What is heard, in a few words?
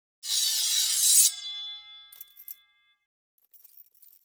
Get Katana; yesterday origins gamerip 2016 switch ps4 pc ios macos